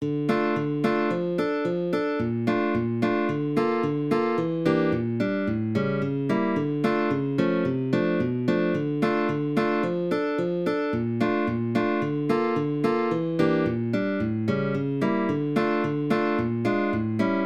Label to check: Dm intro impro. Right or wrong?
Right